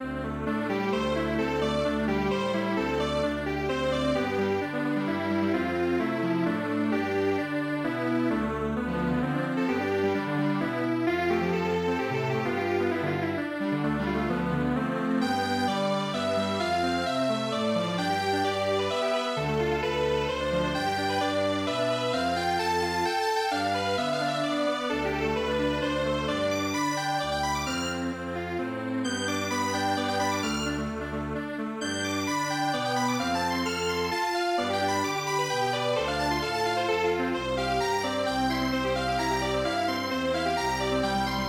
quartet

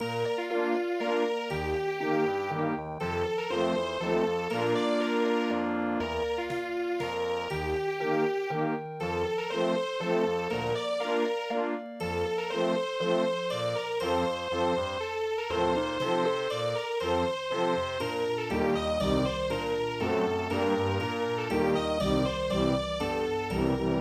vienna waltz

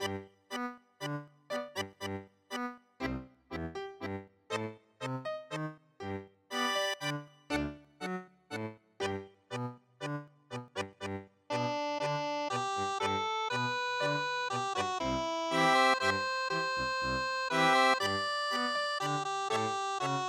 tango, midi

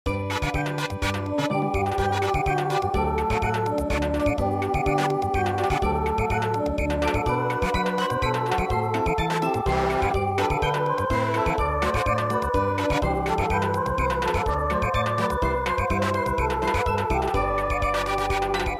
Samba; go on a spree in shabby house

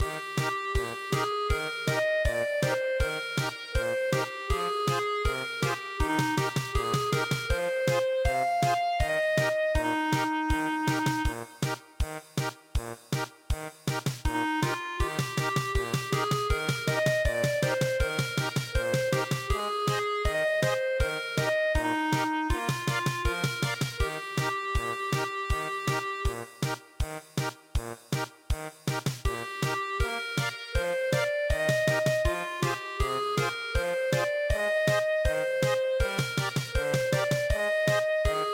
German Polka, midi traditional; Where The Four Leaf Clovers Grow

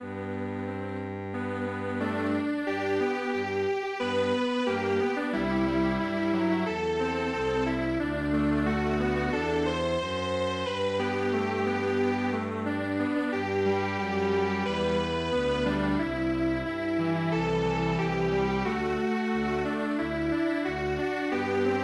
Strings Quartet; foggy foggy dew

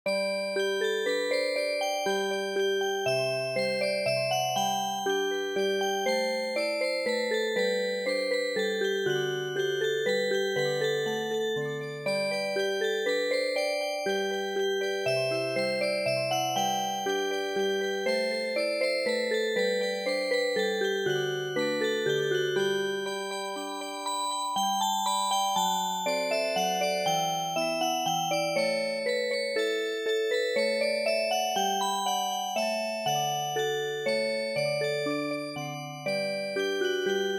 minuet Music Box

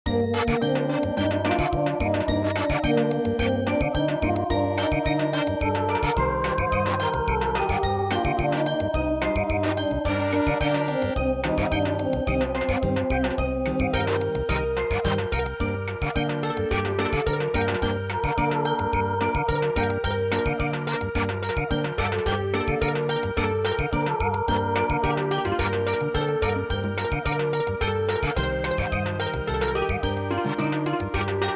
Samba

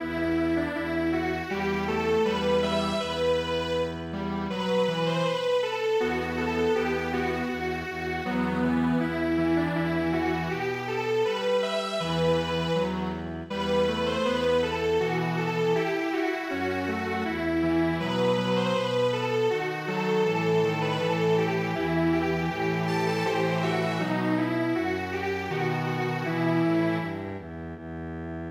Strings Quartet, traditional

